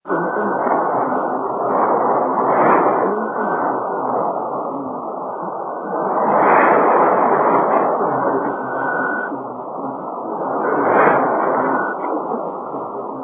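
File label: radio, 3306 kHz; ZBC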